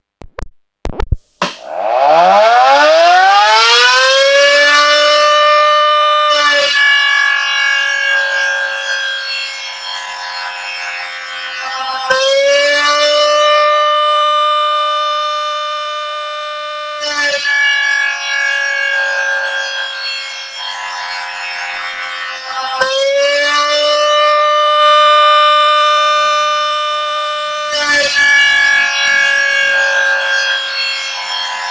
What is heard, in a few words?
siren